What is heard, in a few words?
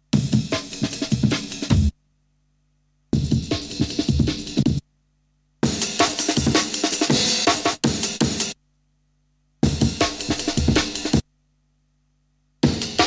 Breakbeats; Kniteforce; 1000 Samples Volume 1